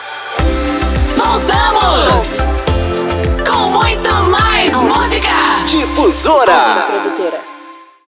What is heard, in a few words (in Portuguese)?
VOLTA BREAK; PACOTE ENTRADA E SAIDA BREAK